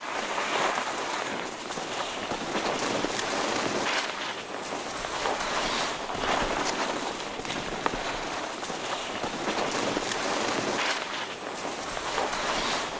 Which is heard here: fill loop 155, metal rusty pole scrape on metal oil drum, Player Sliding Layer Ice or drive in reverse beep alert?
Player Sliding Layer Ice